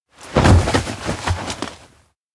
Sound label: drop thud; anemoi